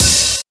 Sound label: open high hat sound b key